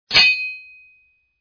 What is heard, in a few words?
shing; Chibi Knight